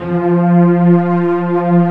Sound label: CMI bow; Greytsounds